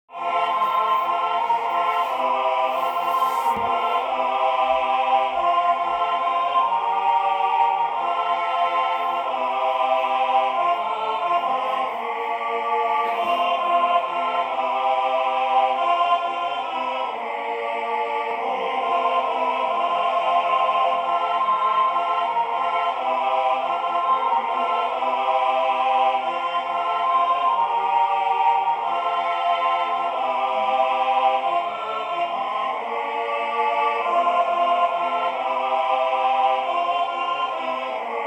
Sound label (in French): Dans une étable Choeur